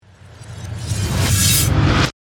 Reverse Shards, Production, Element, Riser, Airy, Crescendo, High, Frequency; Ascending; BlastWave